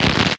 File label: asteroid damage small; Factorio